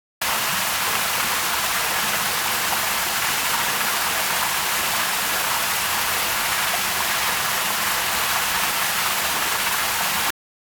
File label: water stream large; best service Blue Box; water streams